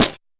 fx drum, Electro FX

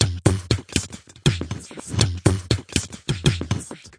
120 bpm voxy dump dump

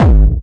psycore kick hardcore dark; drum machines

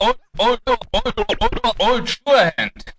orange, Scratching and Turntablism

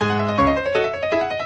164 classical piano loop; KEYS ORGAN PIANO LOOPS

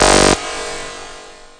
alarm synth verb hit; Alarm Sounds

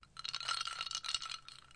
ice in glass; Sound FX Real Life

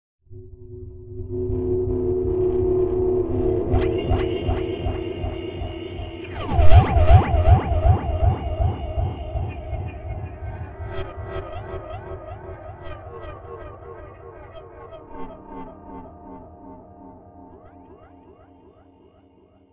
evil pad; Atmospherics

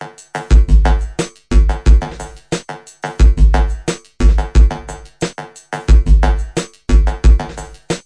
178 phase shift latino; 161 to 180 bpm

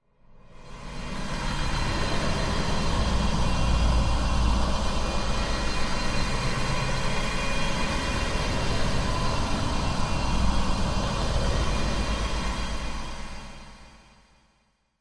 dusty pad; Atmospherics

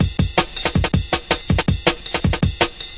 ride break, drum loops and breaks, 161 bpm; 161 to 180 bpm

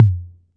606 tom, drum machines; 606 Basic

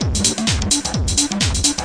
129 bpm techno and melody

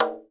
arabic perc, Arabic Percussion Kit

